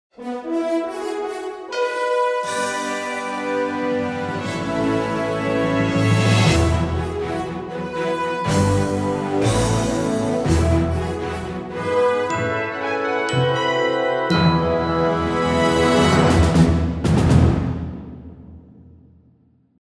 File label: RINGTONE